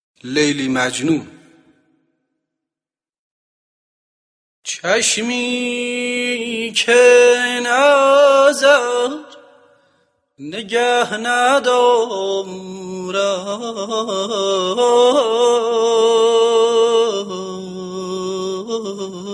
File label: leyli majnon